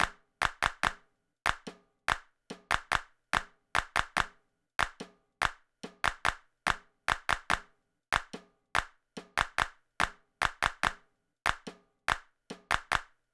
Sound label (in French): rythme